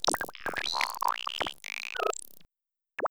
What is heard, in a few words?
intro sound